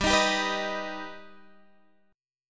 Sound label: G Augmented, Guitar, Drop D; down